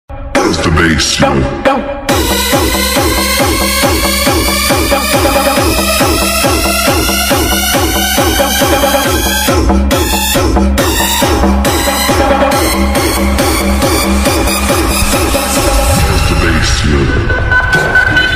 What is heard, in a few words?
trance ringtone drop the bass ringtone